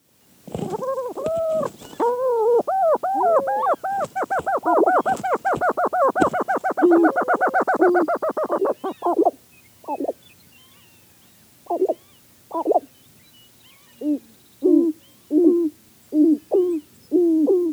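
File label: sounds black grouse; zvuki ptits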